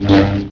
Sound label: whip; mudlet